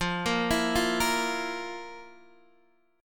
F Major 7th Suspended 4th, Guitar, Half Step Down

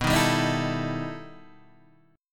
E Minor Major 11th B, guitar

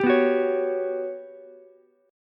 G Major Sharp 11th G down Ukulele C6; GM#11 strummed

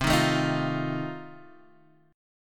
F 7th C

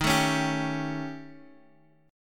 Eb 7th Flat 5th, Eb, Guitar, Half Step Down

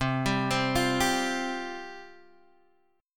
C Suspended 4th C, Guitar, Half Step Down; Csus4

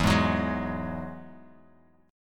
E Major Add 9th E down Guitar Open F; strummed; JGuitar

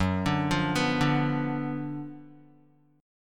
Gb Major 7th Suspended 4th Sharp 5th, Guitar; arpeggiated; 2,5,3,4,2,x; JGuitar

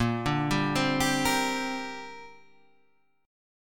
A sharp 6th Add 9th, Guitar Half Step Down; A#6add9

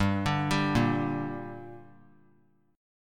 G Minor G down, chord; arpeggiated; JGuitar

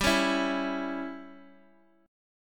C sharp Diminished G

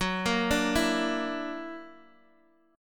Gb Augmented 7th, Guitar Open D; chordshape; down

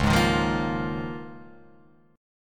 Db 6th Add 9th Db down Guitar Open F; Db6add9 strummed; JGuitar

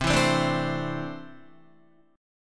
C 6th Add 9th C down Guitar; C6add9 strummed

